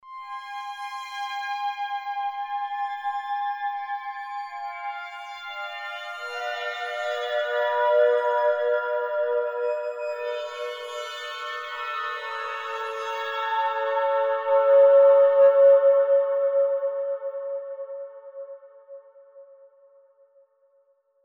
Formant Graintable

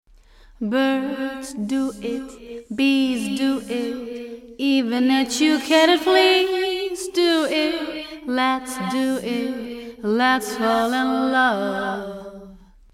Reverse Reverb

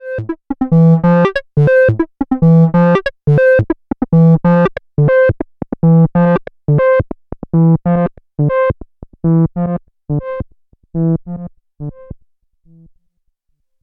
Korg volca modular Low Pass Gate

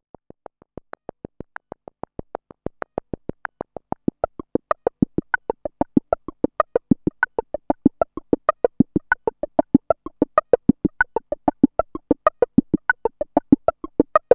dry ENV

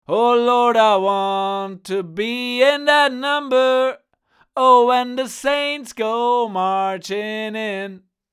Vox U67 Neve1073

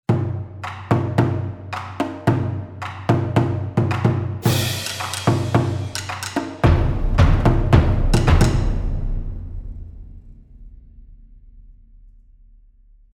Percussion

